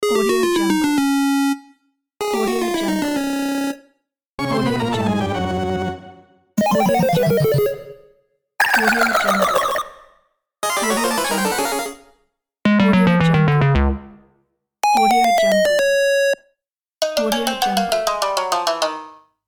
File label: game over sound pack